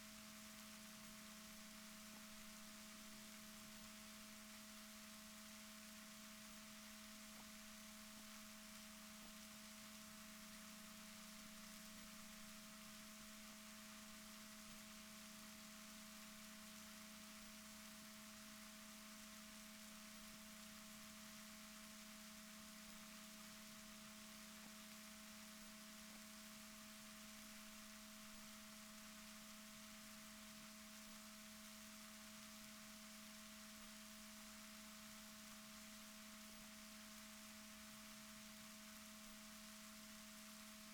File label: rorquals negatif, Balaenoptera Physalus Chile